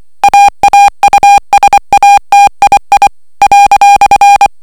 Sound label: Basic beacon; aausatii